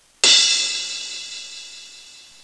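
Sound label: Cymbal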